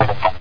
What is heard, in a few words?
clop; Hexen II